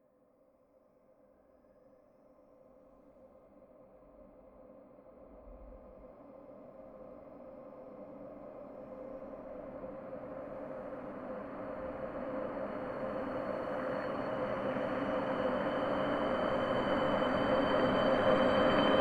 Horror Build Up; Guys We Are Not Alone

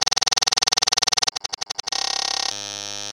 Clicks And Pops

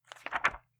Game Ui Screen Transition Book Page Turn

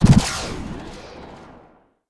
Catapult Shot

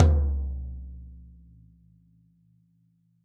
Tom Hard

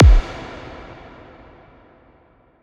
Impact

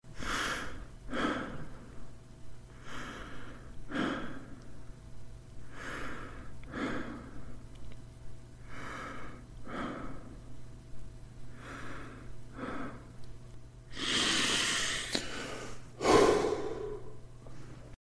Breathing Man With Tired Puff

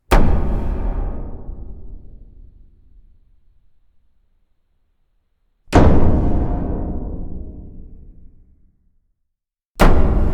Metallic Cinematic Hits

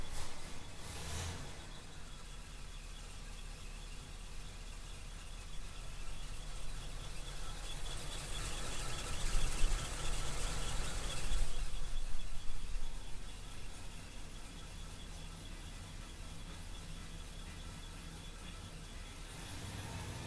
Classic Car Idle

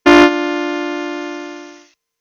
Car horn

